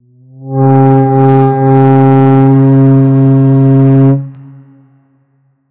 the sound of a large, watercraft

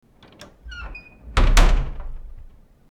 closing an old wooden doo; doors

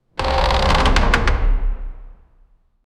the wooden door creaks

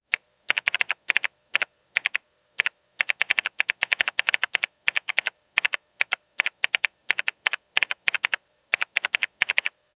clean electronic typing

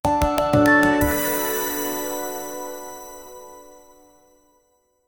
light bubbly pop with a, sparkle

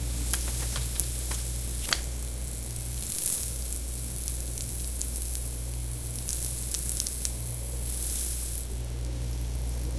a close up recording of a; smearing

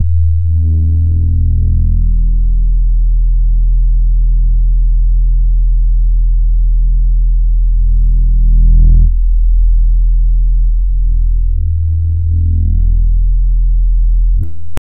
cinematic deep bass outro

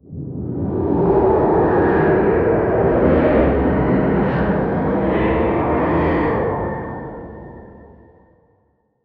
dark fantasy quest fail

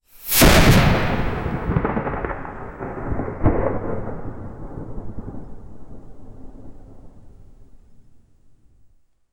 wizard casting a powerful lightning spell, magic

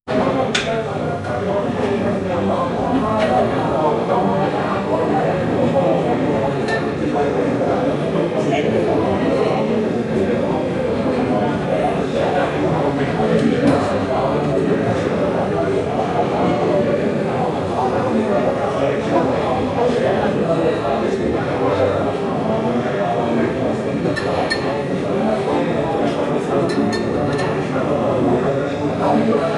the hum of the taverns

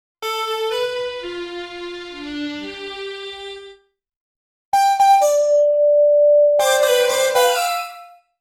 happy sound

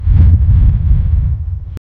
earthquake detected

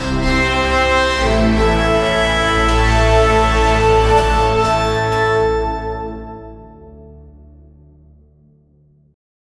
movie like cinematic mafi; mafia

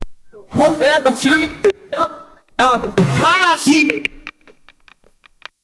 ahhaaahahaa; SFX Engine

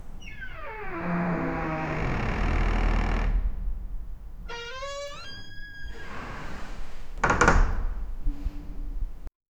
ambient wooden door creak; building

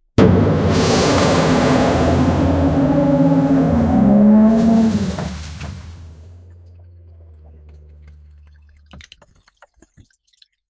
ammonia tank exploding be

